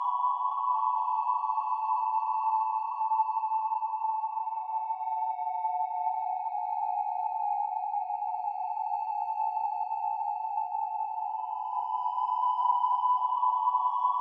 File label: 135BPM Atmo pad, F# minor; Melody & Synth